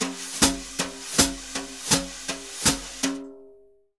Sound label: BRUSH, Jazzistic; Univers Sons